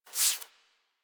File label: Foley Idle Lick Furr; Enemies; Warframe; Catbrow